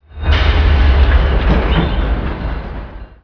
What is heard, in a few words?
train; Spec Ops 2